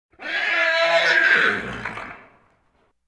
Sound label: Animal effects snorts grunts farm ambience